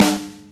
snare, drums; virtual drum sounds; custom drums

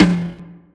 tom 4; virtual drum sounds

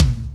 tom; virtual drum machine

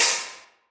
stax, virtual drum sounds

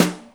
brushes, virtual drum sounds

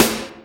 snare 1; virtual drum sounds

